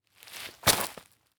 Foley Footstep Boot Single Step Right On Leaves; Foley Footsteps

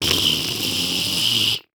Cartoon Balloon Air Release; AdobeCC

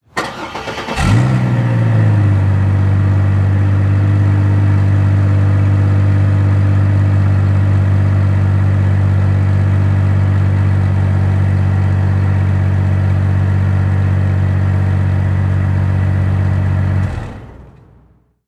Truck Envoy Start Idle Off Exterior Mic In Rear; transportation

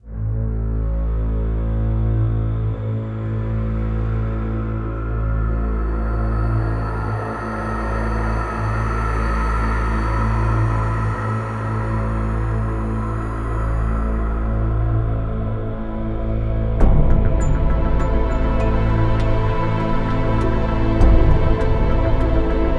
Alien Theme 100BPM; Cinematic Trailer Music; Themesongs

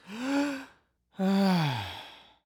Human Male Sighs Of Relief

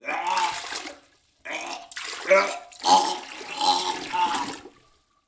Human Male Vomits In Toilet

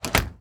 Door Close; AdobeCC; Household

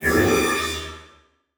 Transition Metallic Warble

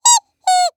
Cartoon Toy Squeak

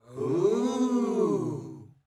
Human Crowd Ooos

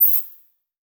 Beep Electronic; Multimedia Internet CD-Rom Flash

